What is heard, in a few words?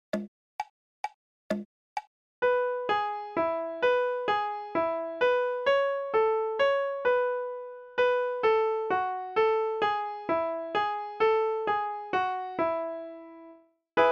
Good Morning In E RH